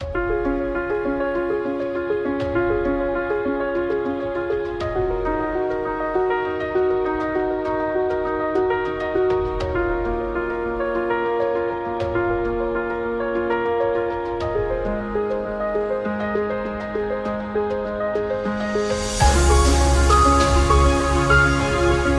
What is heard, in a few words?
Piano Classic